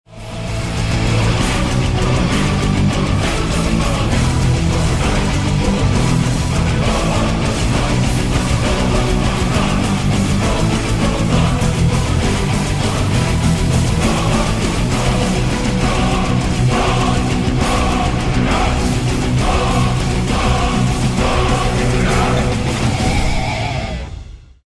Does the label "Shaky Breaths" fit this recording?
No